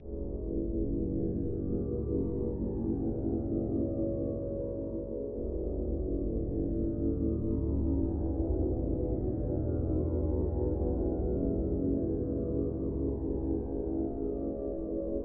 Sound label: (Extended Metal Remix) (Instrumental)